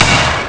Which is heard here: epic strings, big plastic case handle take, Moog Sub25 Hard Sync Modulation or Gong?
Gong